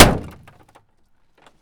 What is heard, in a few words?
impact wall tap; Kart; AOS RF1 Kart Demo